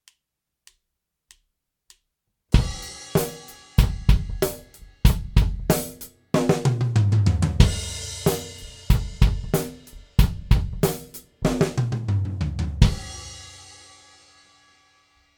Gate Mix; Drum Recording Setup

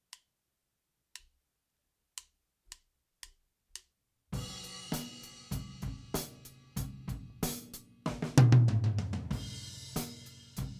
No Effect R tom; Drum Recording Setup

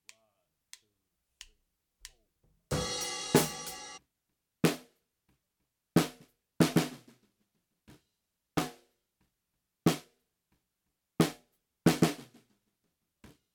Gate Snare Bottom; Drum Recording Setup

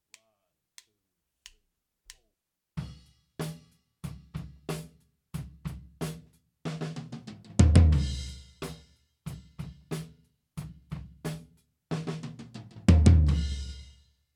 Gate Ftom; Drum Recording Setup

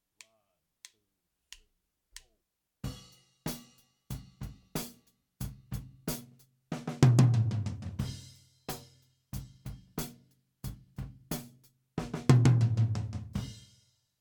Gate Rtom; Drum Recording Setup

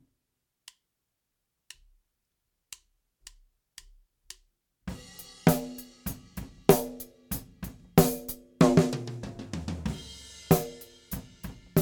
No Effect Snare; Drum Recording Setup

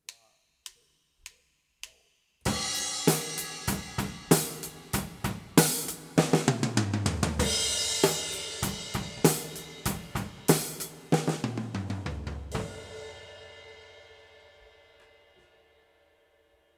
Reverb Overhead, drum recording; Drum Recording Setup

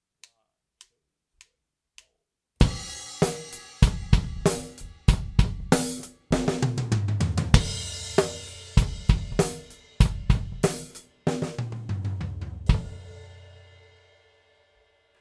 EQ Mix; Drum Recording Setup

